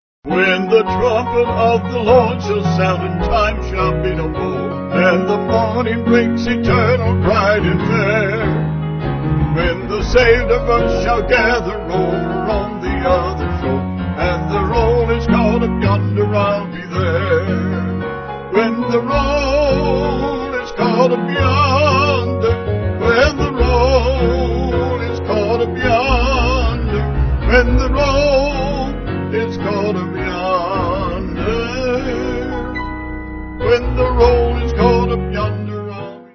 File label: Vocal Piano; When The Trumpet